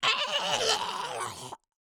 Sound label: goblin death